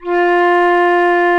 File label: FLUTE F4, WOODWINDS; Greytsounds VOL-1